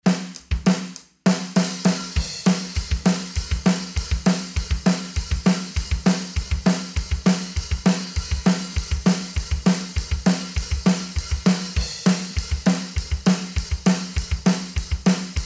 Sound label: Drum Buss bypassed